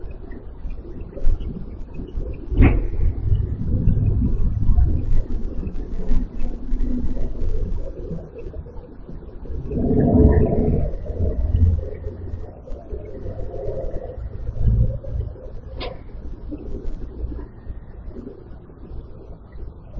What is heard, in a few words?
Static